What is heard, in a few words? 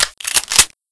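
Desert Eagle clip in; czero opforce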